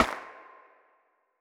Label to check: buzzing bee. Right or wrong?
Wrong